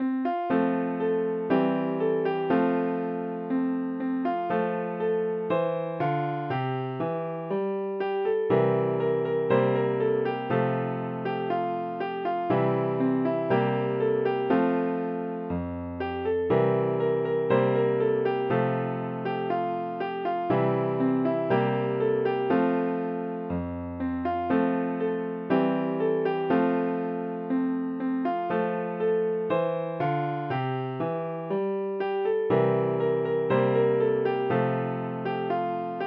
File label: Piano